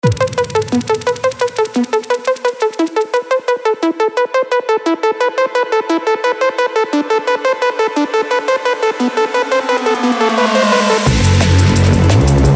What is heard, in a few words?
Bass, Drum and Bass; Rewind Samples; Neural Drum and Bass; Serum; Massive